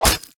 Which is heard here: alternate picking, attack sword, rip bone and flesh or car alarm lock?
attack sword